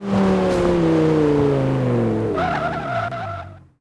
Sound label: throttle off, vehicles; gmod; tdmcars; gallardo